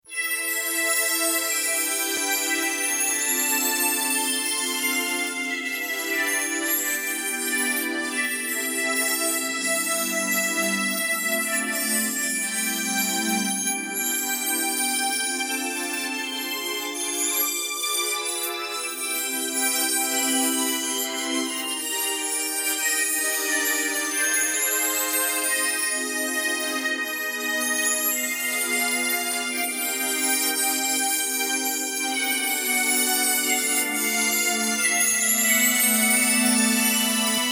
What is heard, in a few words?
instrumental